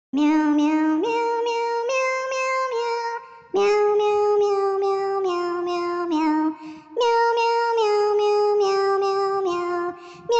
Twinkle Voc; purr pals 2007 nds

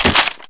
ui unequip Weapon

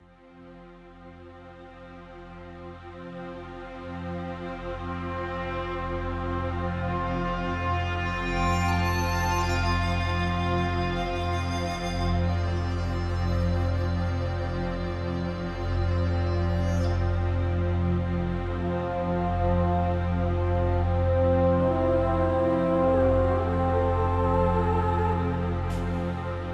Background Music; Platoon

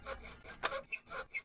Strange Noise; Lethal Company

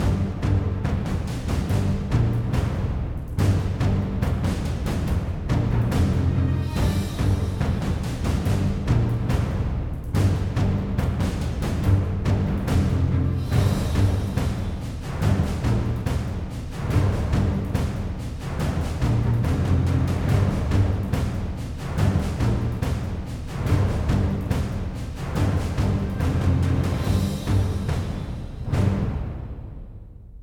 Dynamic Field Battle Tension Medium

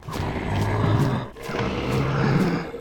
zombie walk

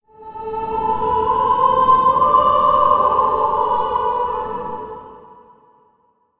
Holy Voice

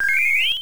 Flute; tengai makyou ii manjimaru pc engine super cd rom2; Shinobi Flute

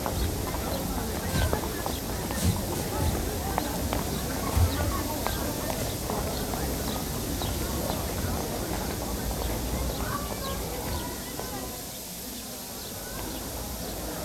STATION INT

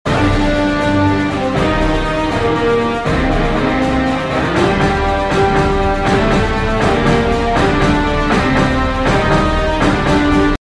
Win

